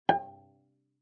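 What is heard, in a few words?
Cello pizz fortissimo sul A G5; MIS Pitches - 2014; Strings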